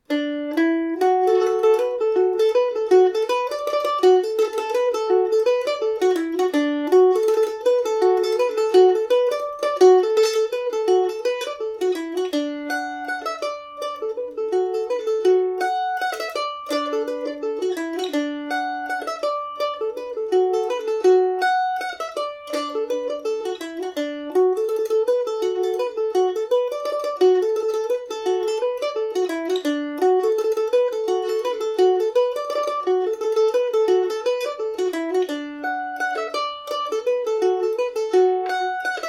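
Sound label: D major, irish mandolin; mciljohns no 1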